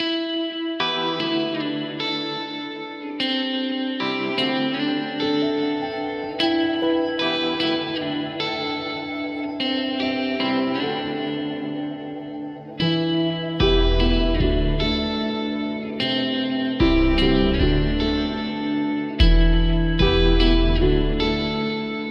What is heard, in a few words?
Float, No Drums